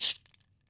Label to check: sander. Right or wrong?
Wrong